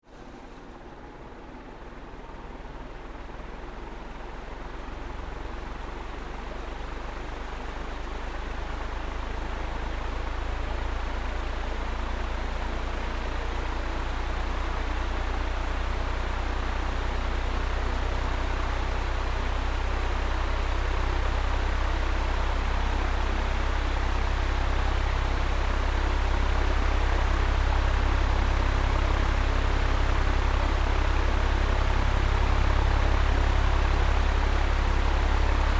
Industrial Fan Run, Industry, Industrial, Fan, On, Run, Off; Factories; BlastWave